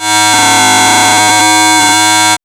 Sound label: MOOG D 5; AMG - Vince Clarke Lucky Bastard